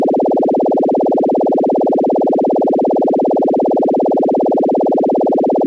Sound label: Modulated A111; CGS; Mod-Sweep